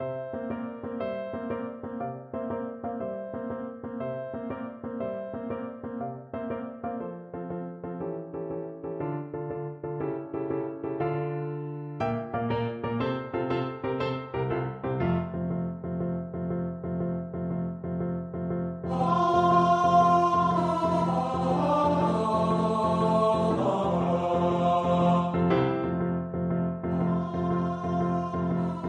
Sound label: funiculi, tenor voice